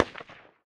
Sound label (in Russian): priglushennij vistrel iz granatometa